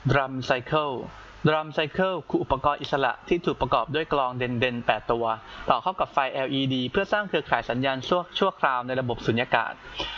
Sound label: drumCircle; tha